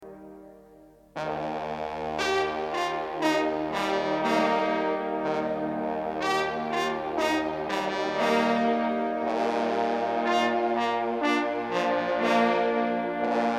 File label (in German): Kurfuerstenglocken